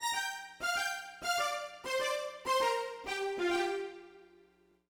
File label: Smooth Groove Strings, 70s Analog Funk